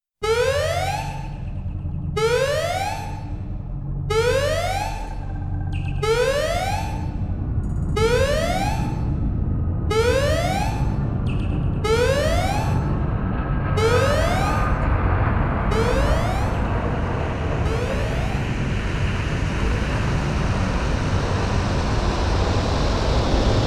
Sound Effect - Crash Landing

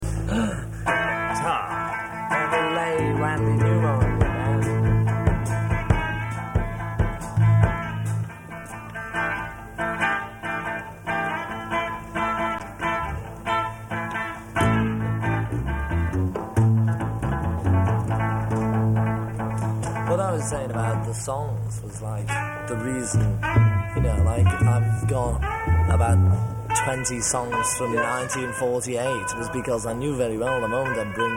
Instrumental

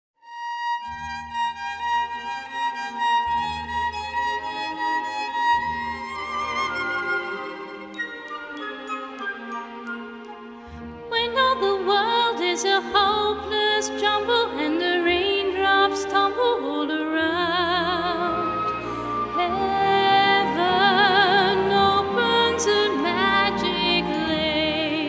female vocals

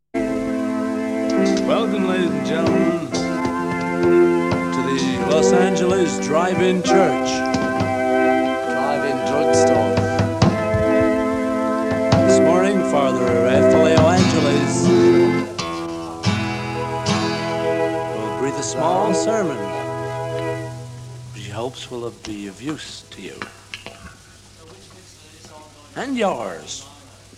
Organ Instrumental

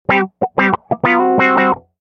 Funk Guitar; elements

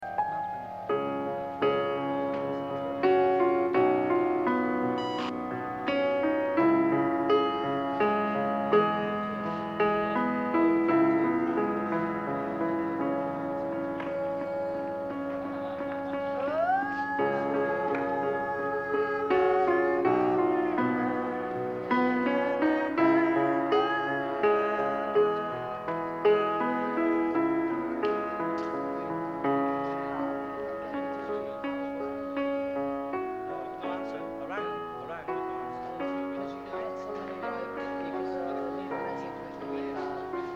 Instrumental

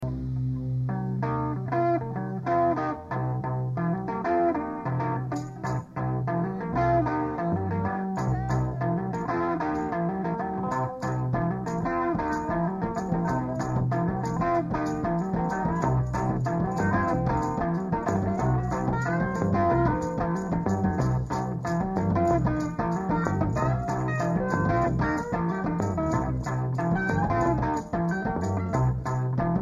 Improvisation

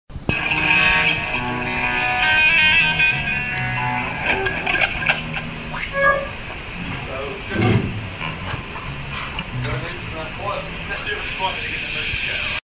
instrumental

